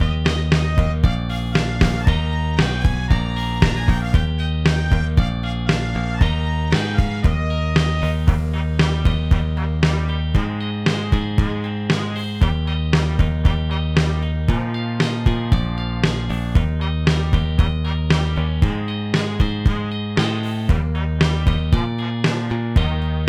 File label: Eb Bubble Gum, brass